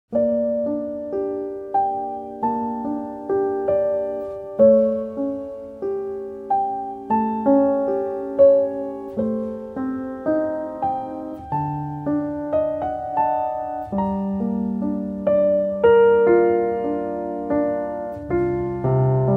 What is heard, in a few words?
Piano collection